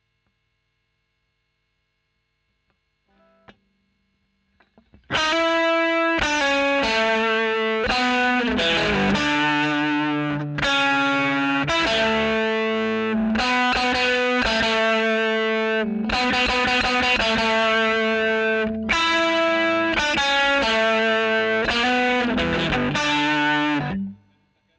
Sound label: E. GTR Royer OD